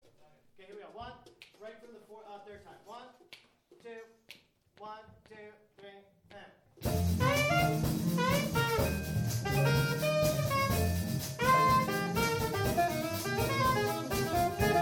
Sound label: with solo